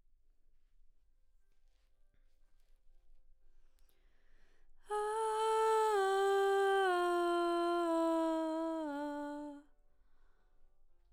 BG Vox

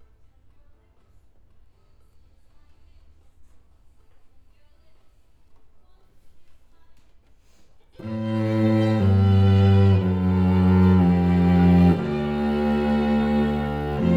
Cello

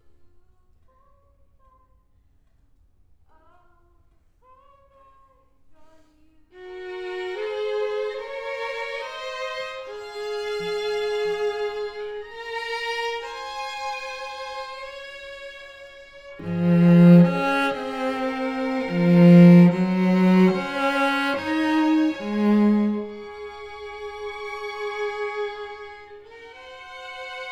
Cello

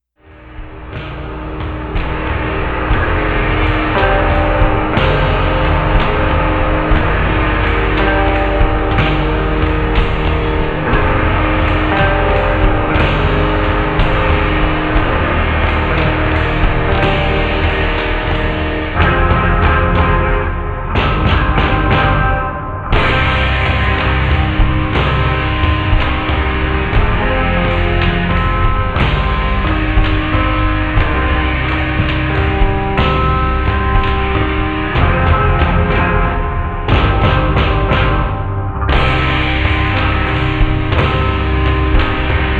Instrumental

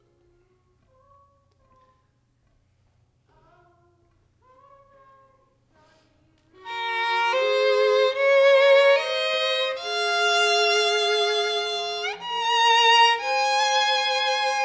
violin

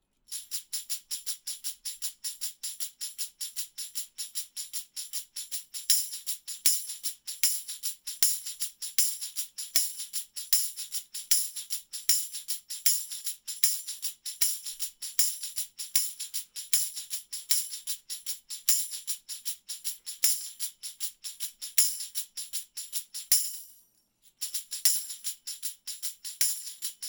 Tambourine

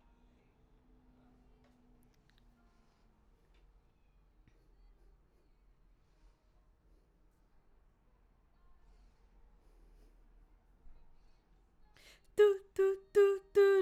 BG Vox

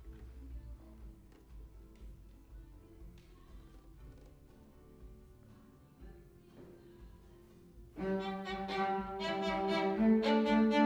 violin

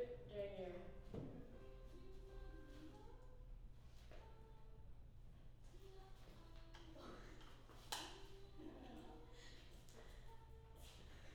Choir Harm High

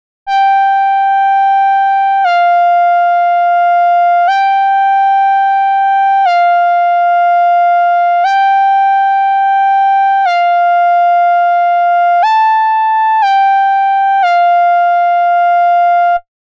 Moog

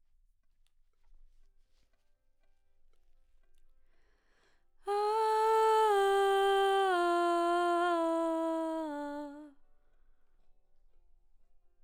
BG Vox

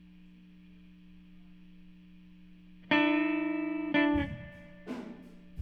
GTR

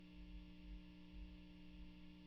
GTR

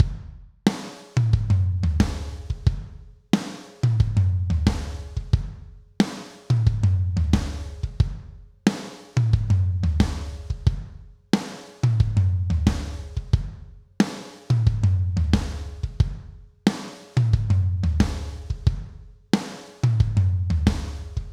BEAT

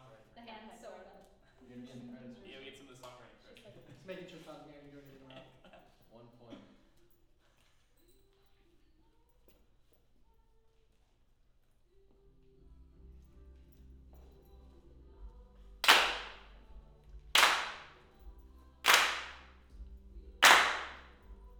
Claps Mid

